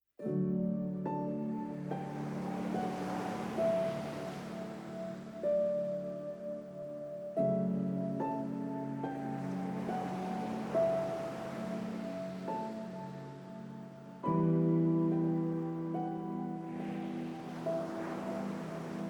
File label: piano waves